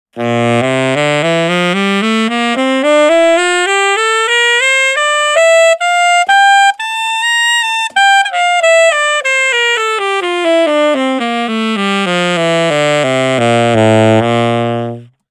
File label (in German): bright balance short tonleiter